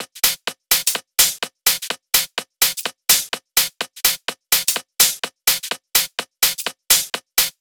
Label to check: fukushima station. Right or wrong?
Wrong